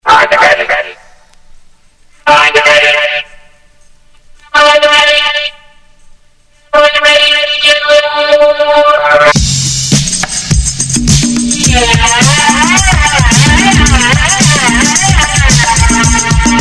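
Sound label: hip hop, Beat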